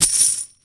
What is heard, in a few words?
money pickup; video games; source engine; tf; mvm